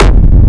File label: Industrial Techno Kick oneshot - F; kick drum